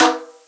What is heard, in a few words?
Percussion G